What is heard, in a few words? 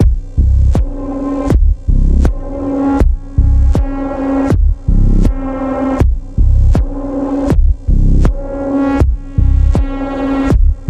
Throbbing Pulse, Key of C